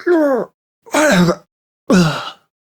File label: Death; Warframe; Corpus Lich; Persona C